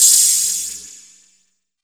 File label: HH CLOSED, Disco House